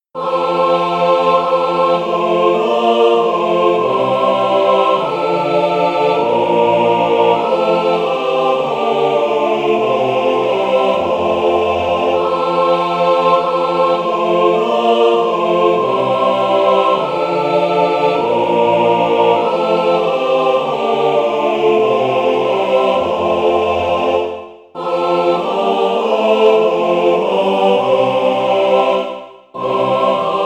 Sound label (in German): Es ist ein Ros entsprungen aah